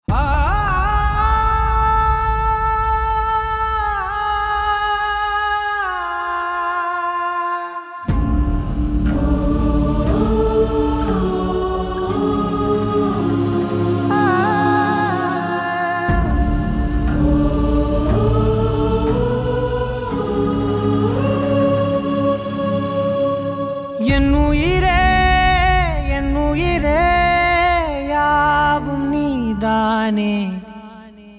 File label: Male Vocals; Tamil